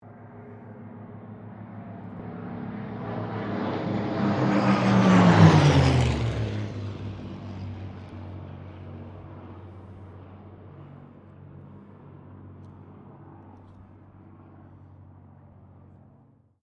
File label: AIRCRAFT SUPERMARINE SPITFIRE PAIR PASS; Airplanes; Vehicles